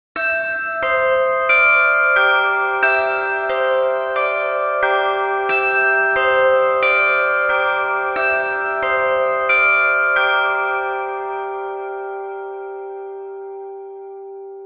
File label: Eerie clock chimes sound